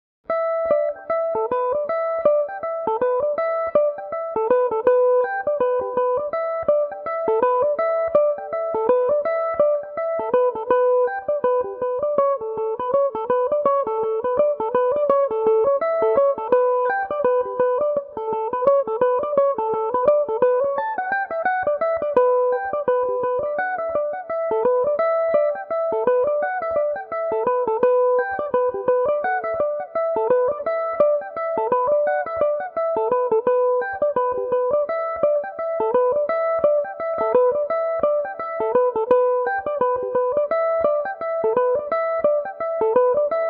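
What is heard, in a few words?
A mixolydian, irish mandolin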